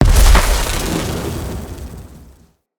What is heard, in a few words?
Lightning Grenade Explosion; Weapons; Enemy